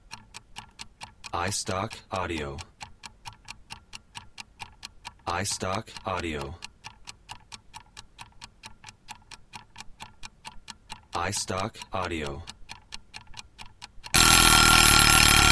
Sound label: kitchen timer